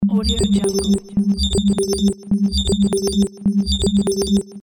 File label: sci fi rhythmic ambient loop